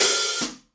wet open high hat sample f key, open high-hat sound tuned to the F note